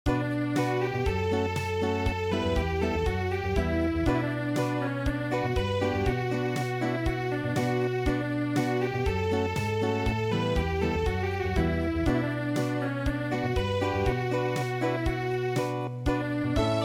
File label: Acoustic, traditional